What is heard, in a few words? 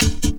techno, percussion techno; Ueberschall; Techno Trance Essentials; DRUMS